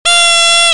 Sifflet carnaval

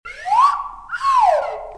Sucette à sifflet

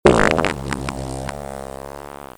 Pet

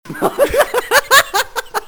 Rire ahahah

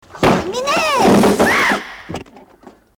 Neige qui tombe

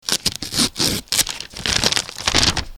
Enveloppe; bruitage; Electro-menager

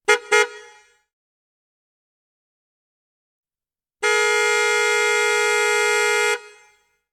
Le klaxon

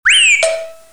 Sifflet

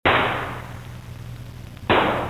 Pistolet; bruitage; Armes

